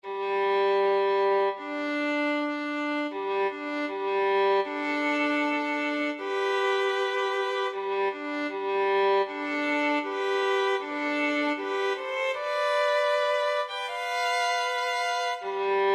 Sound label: tone test